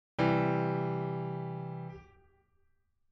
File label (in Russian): trezvuchie do mazhor